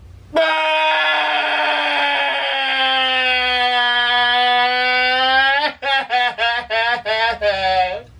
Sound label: track crash; ExperimentalGear